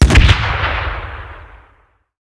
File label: air burster explode, weapons; tf2classic